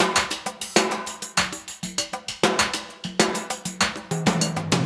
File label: drumloop